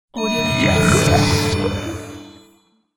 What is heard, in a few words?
death regrow spell